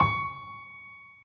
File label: normal hit whistle; osu!